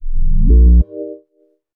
company ident sting

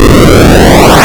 Whoosh; Defender

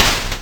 Snare; Discs Of Tron

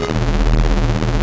Tron Tank Loop

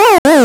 Hit; Galaga

